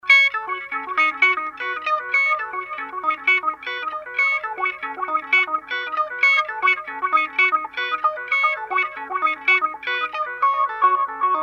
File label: Delay; Wahcko; Delay Llama